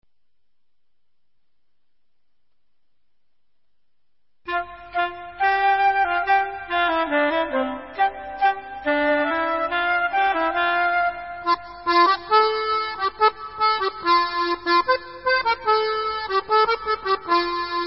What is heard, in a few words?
Instrumental